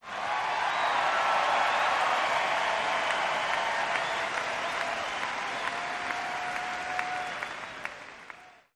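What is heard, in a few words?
Crowd 50000 Whistle, large int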